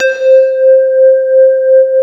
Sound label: Casio VZ1, A#4